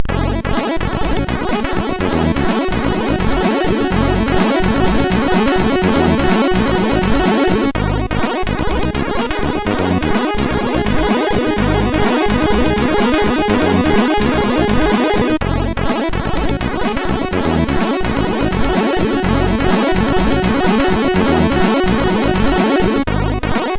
Musique, MOS6581R4; Musique 3

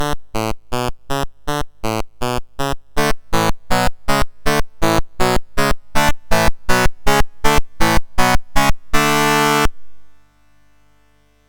Cheer, MOS6581R4; Imagineering Inc

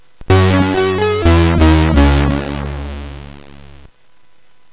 CSG8580R5, GAMES; Solitaire

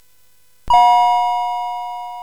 Dark Castle, CSG8580R5

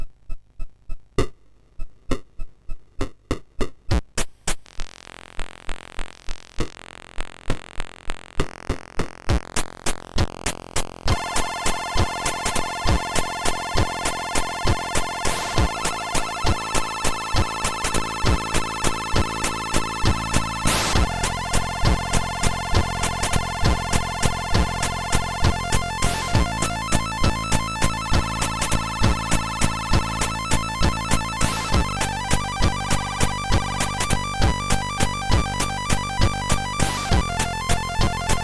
3 Bit Chip, sid, MOS6581R3

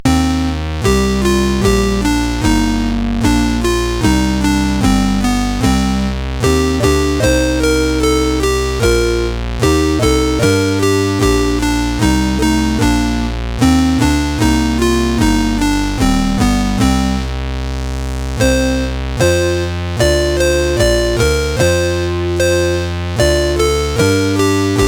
Heavy Tune, CSG8580R5

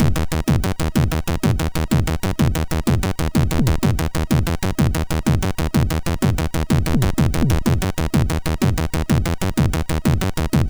Techno, MOS6581R2

